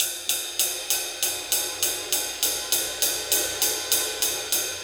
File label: Ride 99 BPM; AMG; Keith LeBlanc Phat Butt Jams